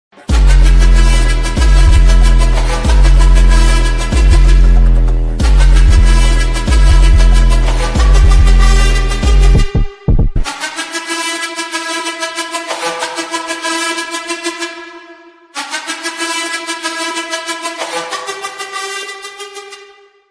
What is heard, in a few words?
Music